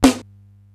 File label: SDS9 SN, Simmons SDS-9, Snares